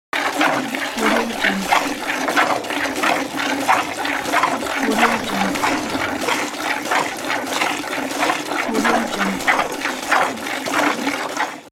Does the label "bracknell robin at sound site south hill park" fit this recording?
No